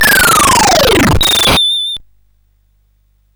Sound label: sid CSG8580R5